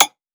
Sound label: tearout bass; M8; percussion and foley